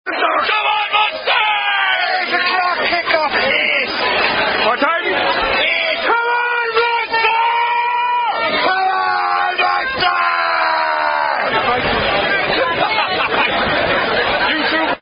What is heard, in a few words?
Singing Songs, Unknown Rugby Song Sung By Fans; Munster Tolouse In Cardiff May 2008